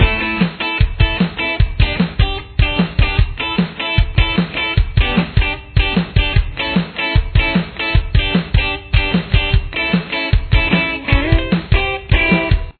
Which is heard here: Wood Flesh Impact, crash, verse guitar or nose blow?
verse guitar